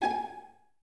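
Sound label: Violas G#5 pizzicato, String Ensembles; Miroslav Vitous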